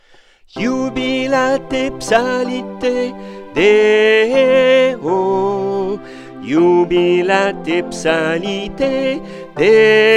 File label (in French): Coda SOPRANE